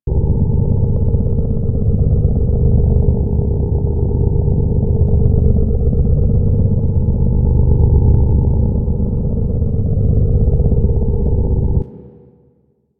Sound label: Machine Room Hum; Warframe; Liset; Hub